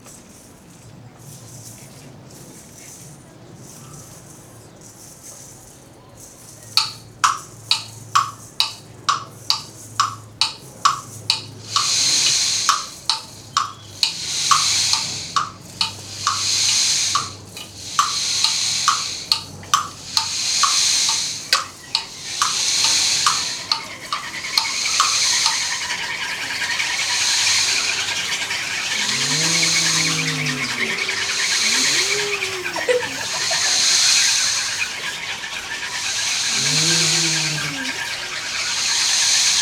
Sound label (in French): Paysage sonore Ocean